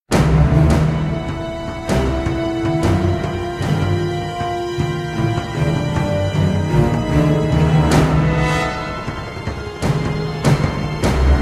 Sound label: night attack loop